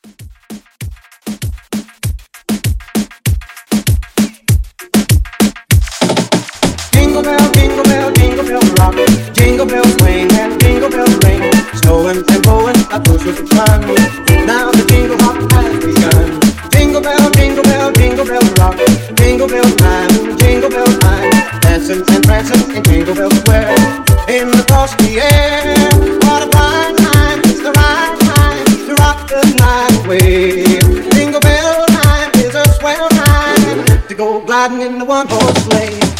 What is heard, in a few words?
Moomba Christmas Re Drum